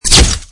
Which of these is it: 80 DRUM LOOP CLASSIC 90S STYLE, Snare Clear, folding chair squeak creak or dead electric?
dead electric